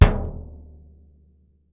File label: BD F, Percussion; Miroslav Vitous - Percussion & Harp